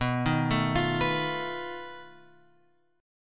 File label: B 7th Flat 5th B, guitar chord; B7b5 Chord {7 6 7 X 6 7} arpeggiated